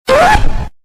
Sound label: fail